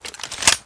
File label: clip in, weapons; cstrike